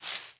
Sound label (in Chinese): 杜鹃树叶踩踏声